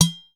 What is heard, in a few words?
udu